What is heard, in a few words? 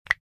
Bone Click